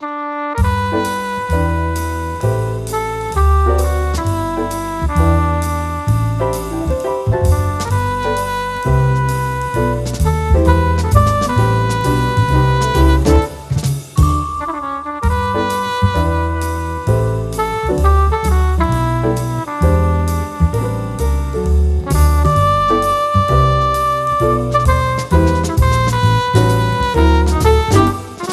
Underwater Music; despicable me minion rush full original score soundtrack

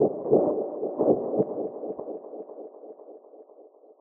Library Noise

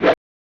Swing

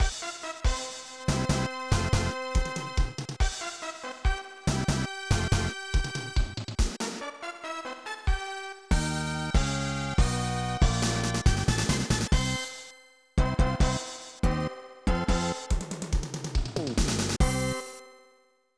Pre-Menu Stinger; ABC Monday Night Football; SNES

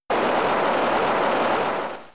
Hydro Pump

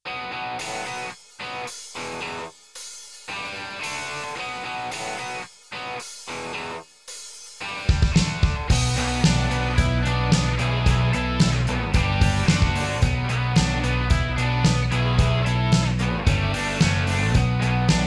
Radio Rock; Gangstar West Coast Hustle; Android

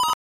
Coin; Hisshou 777 Fighter II; SNES; Paropicana7X

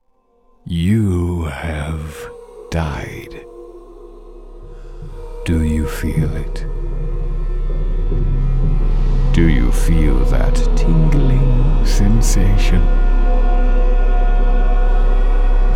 Game Over Sound; YIIK A Post Modern RPG

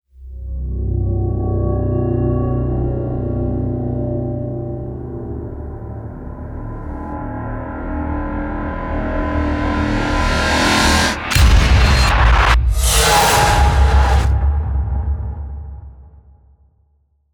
Cutscenes Music; Serious Sam 4; Forum

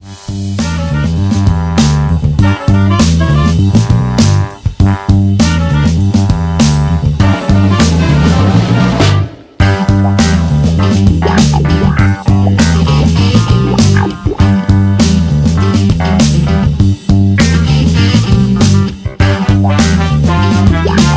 Menu Music

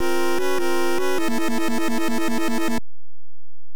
Miss; Gumshoe

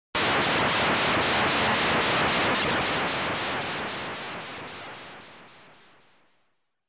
Turban Shell Tank Movement Sound; Kaijuu Densetsu The Tale of the Shell Monsters

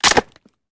Bone Damage; angry birds

